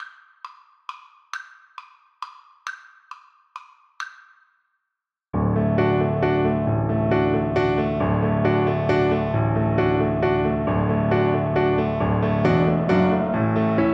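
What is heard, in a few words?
VLC karaoke, Cello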